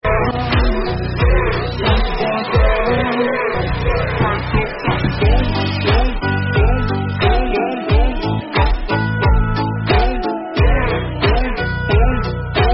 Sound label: victory dance meme sound; pubgmobile